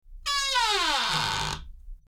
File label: Creaking Door; horror; horror sfx vol 1